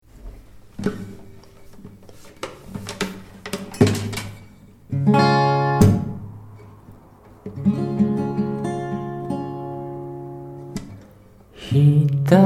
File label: waltz cover; rennweg